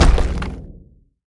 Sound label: hit ground; smite; god geb skin7